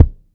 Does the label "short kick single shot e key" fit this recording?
Yes